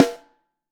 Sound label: Snare Top